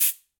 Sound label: Cabasa Long; Latin Percussion; Drums; Drum Hits; Shaker; Ableton; Factory Packs